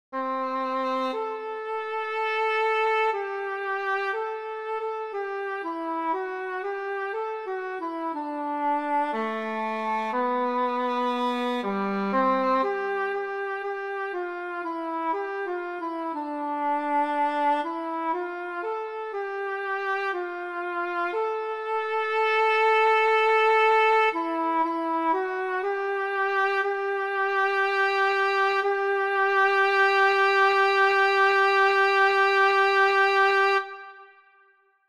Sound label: tenor; 2026 spring concert